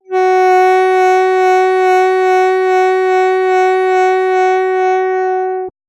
sax traditional

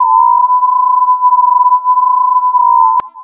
sine freq mod removed

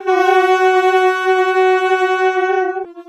sax mod removed

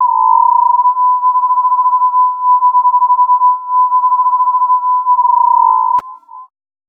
sine freq mod removed long

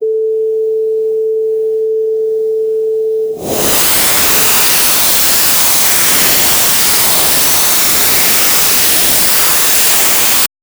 sine noise long

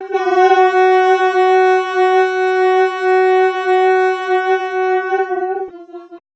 sax mod removed long

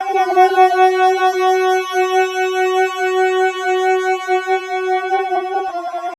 sax mod added back

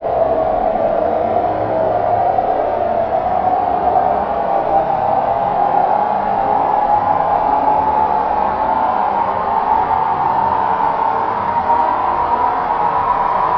wind trad

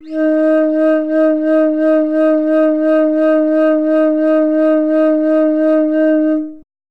flute traditional

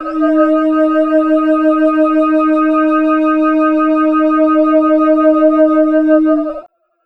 flute mod added back

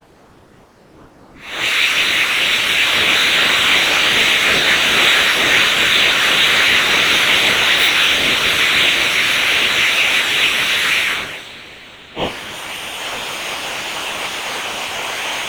breathing long

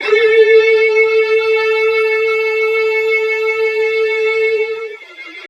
violin mod added back

